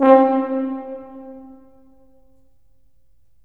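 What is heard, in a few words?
brass tuba; LA Composer vol. 3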